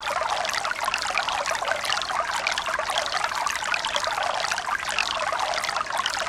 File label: stream loop